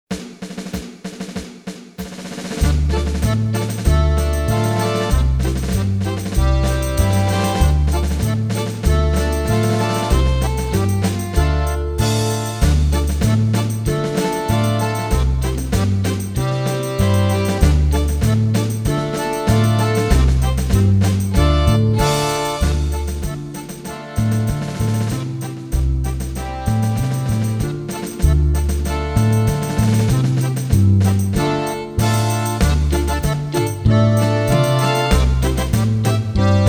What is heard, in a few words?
Sea Chantey